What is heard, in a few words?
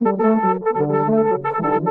Old Horn Texture; Ableton; Factory Packs; Inspired by Nature by Dillon Bastan; Emit Presets